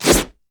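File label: wolfman basic attack; LoL; warwick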